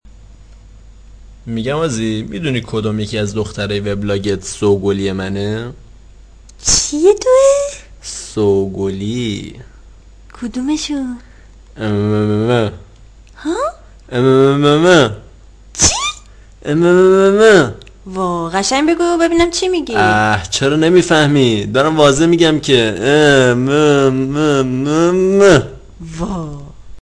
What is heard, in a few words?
Minimal